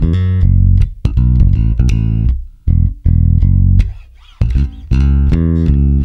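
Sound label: shuffle F#, bass; Spectrasonics; Bass Legends; SHUFFIT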